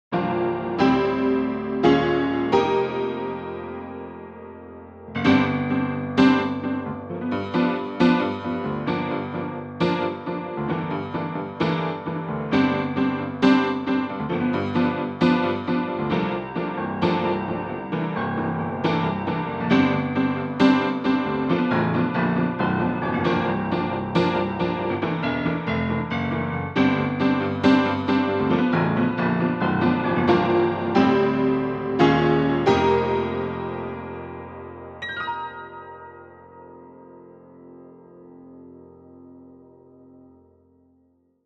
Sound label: Honky Tonk; VIVO S10